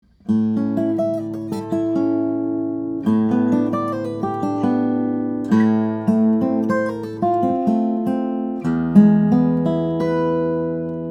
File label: guitars; Lowden O 35c SLE; brazilian rosewood; cedar; flattop